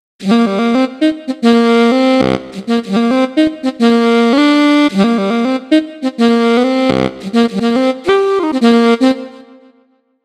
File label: Alto Sax Stretch